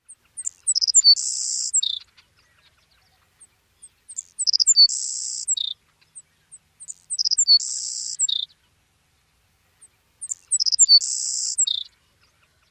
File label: songs, Sierra Nevada; CA